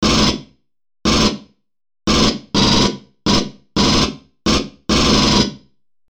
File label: M41A Pulse Rifle; FXHome; Bullet and Gun